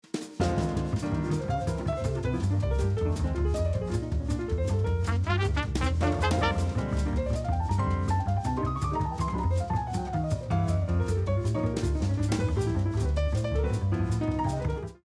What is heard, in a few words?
Fast Jazz Theme; KCTS